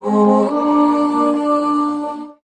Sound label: "ooh" SUNG